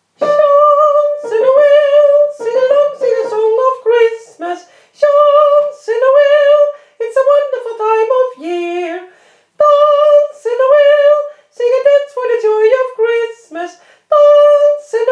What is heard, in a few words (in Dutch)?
sopr 2 en alt 1